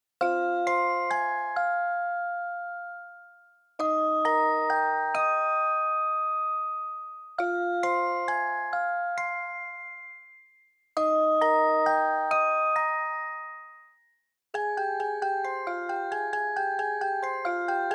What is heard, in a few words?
Music Box; Delia's Music Box